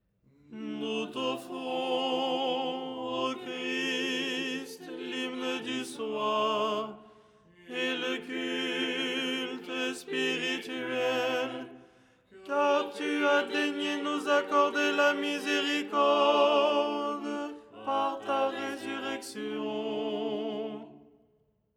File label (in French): tenor